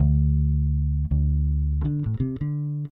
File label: bass allee, Loops, Phonky